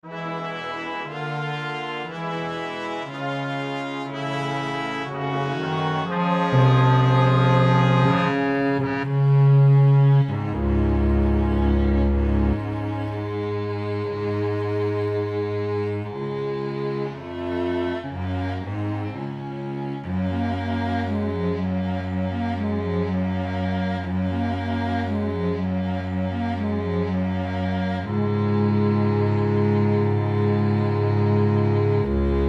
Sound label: orchestral; melodics; palette; Red Room Audio